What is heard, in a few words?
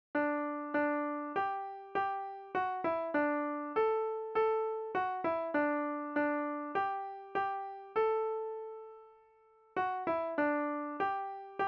hymn melody